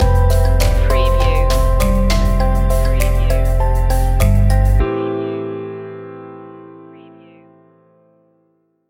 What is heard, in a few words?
mandarin tree stinger